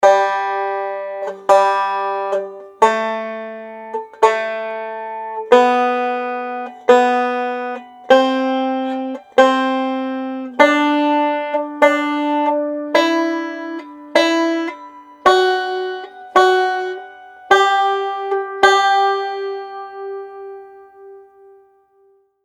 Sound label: G Scale on Three Strings